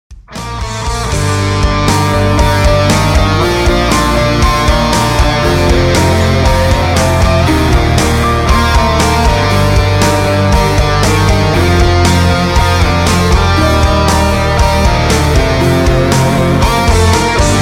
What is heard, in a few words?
Instrumental